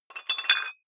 dumbbell thread put on small weight quick, fitnessstudio